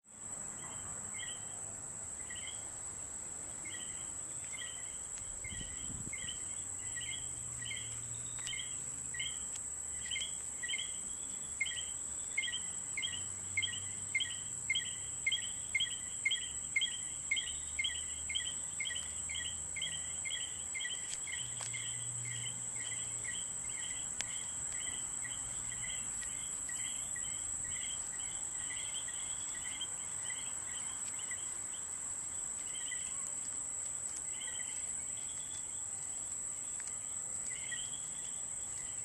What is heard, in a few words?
sounds of home nightime